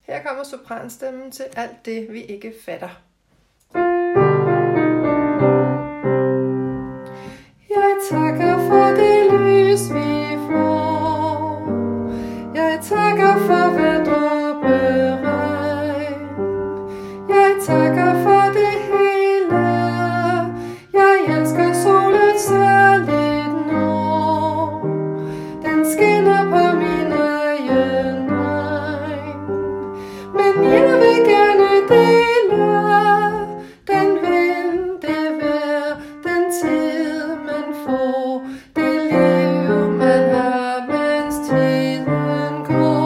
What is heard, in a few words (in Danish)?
sopran